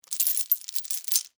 Chain Movement, Industry, Chain, Movement, Heavy, Chain; Factories; Blast Wave